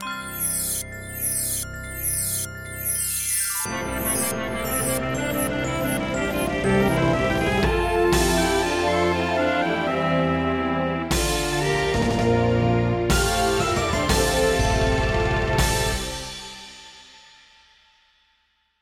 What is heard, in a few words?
fanfare; Paramount fanfare 1987 1st ver